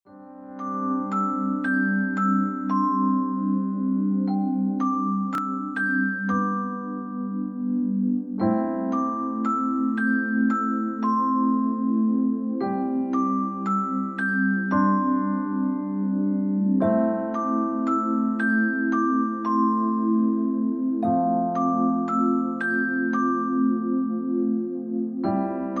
Piano Relaxation

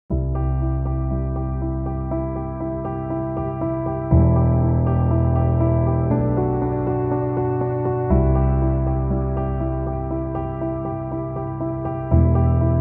piano instrumental

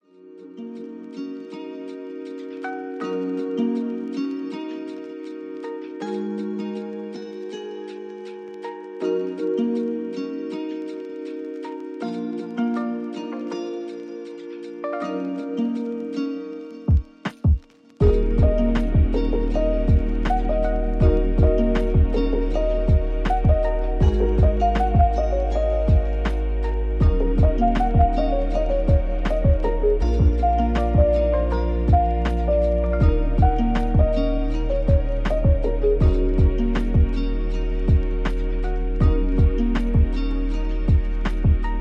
instrumental lofi

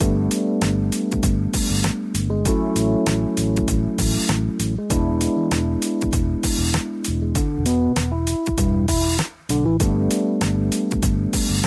Lounge Downtempo; Chill Out y Lounge

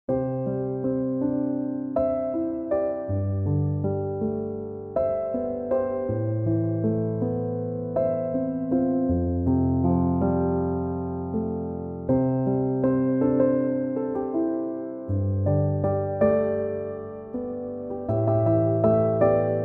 chill piano instrumental

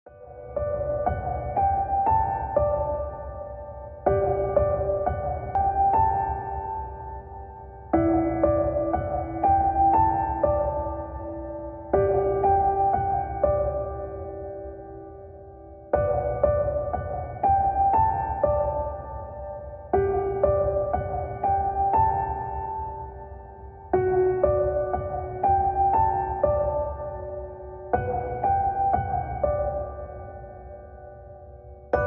Piano Relaxation

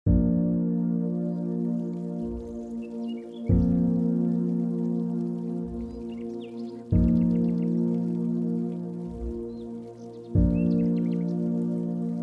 Spa music with birds

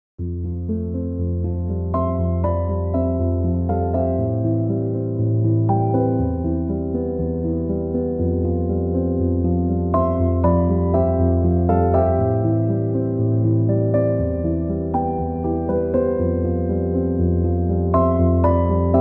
chill piano instrumental

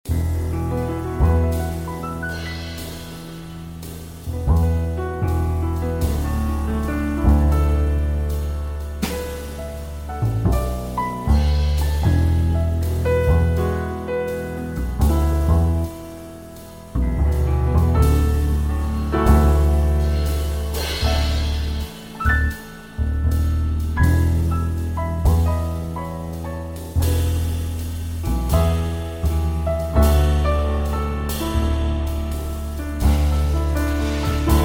Jazz Piano Trio; Bossanova; Barcelo Hotels recepcion

